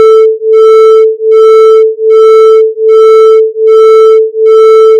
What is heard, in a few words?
Autogenerated sine 120 cents